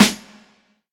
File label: large room snare one shot g sharp key